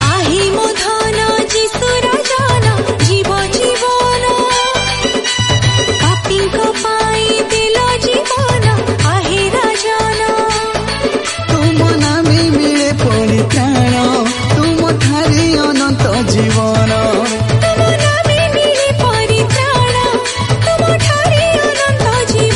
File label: Odia Christian Song